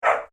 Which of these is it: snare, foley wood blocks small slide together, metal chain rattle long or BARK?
BARK